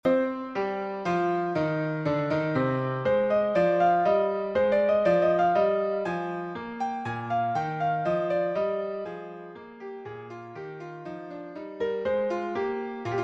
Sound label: Echo Minuet, piano